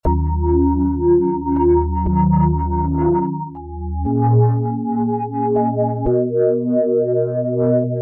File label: sustained sine chord; foxfire; chiral audio